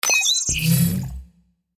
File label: confirm